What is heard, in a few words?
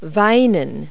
cry; Multi-Lingual Talking Picture Dictionary